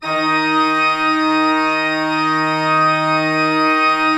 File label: Cathedral Organ; Propeller Island